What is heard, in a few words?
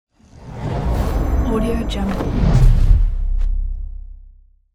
ultimate magical spell; infinity sfx productions